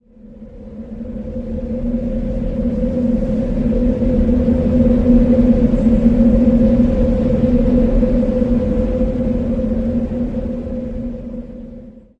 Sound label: gust, Mines; AMB; OneShot Fading Memory